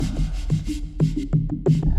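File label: Music Soft Ground 120; From Dust; PS3; Xbox 360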